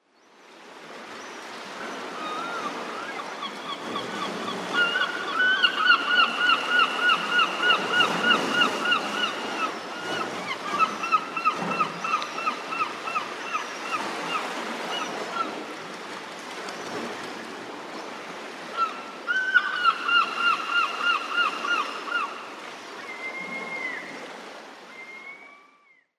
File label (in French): Goeland argente, oiseaux; audio les oiseaux